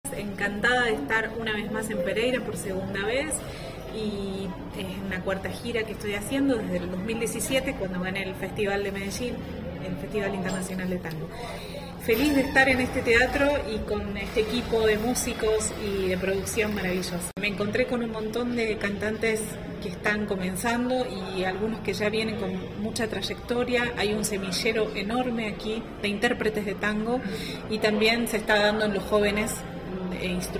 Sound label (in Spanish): cantante Argentina de Tango; Gala de Tango; fiestas de la cosecha pereira 161 años